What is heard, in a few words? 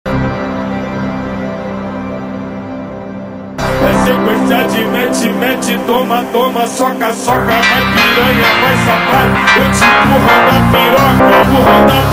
Brazilian Phonk